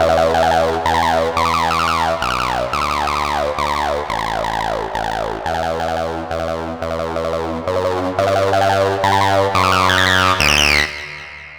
bass fill

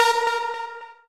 lead a#4

